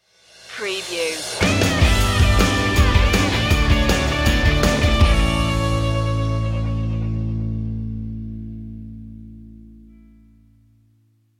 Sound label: lead by fortune stinger